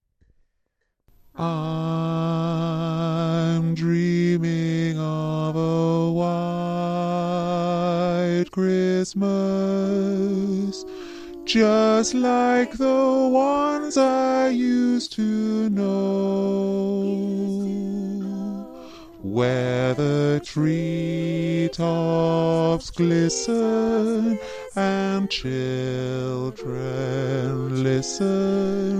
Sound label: e low, choir